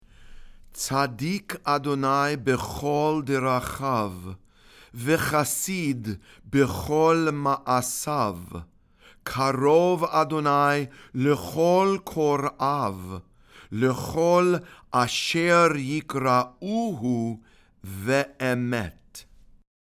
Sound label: ashrei read and sung